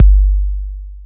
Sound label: Main 808 Kik A Minor; 808s